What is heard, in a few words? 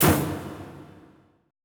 ETH DRUM